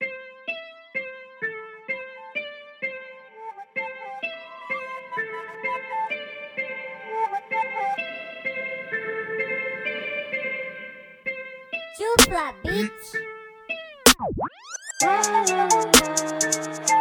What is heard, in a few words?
trapp intrumental; Studio effects